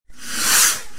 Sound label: rockets Start, fireworks